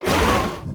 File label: Flaming Dragon Fast Attack; Blind Quest - The Frost Demon